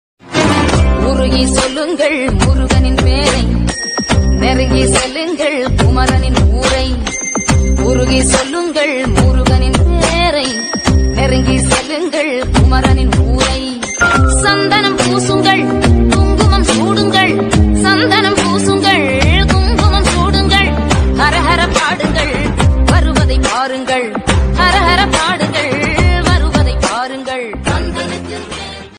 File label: tamil ringtone devotional ringtone murugan bgm ringtone